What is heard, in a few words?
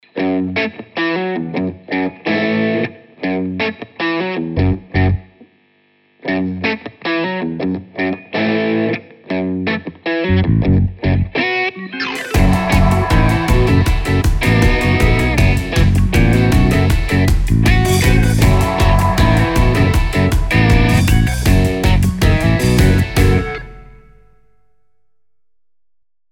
Guitar riff